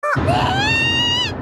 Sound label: screaming